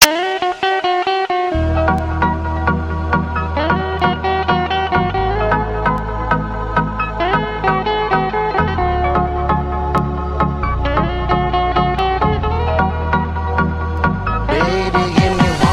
Guitar Remix

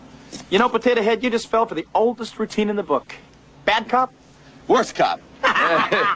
secret unlock

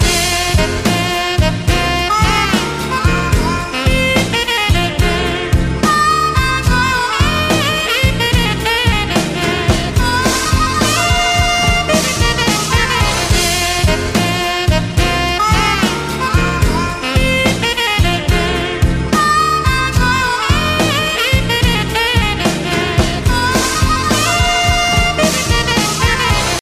Blues

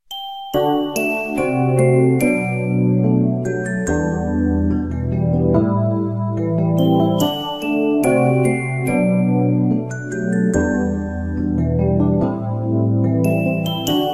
romantic melody; classical

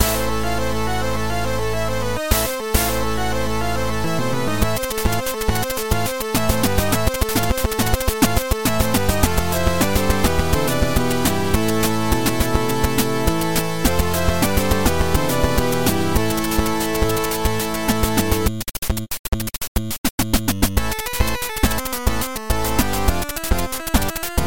8bit Battle, ringtones

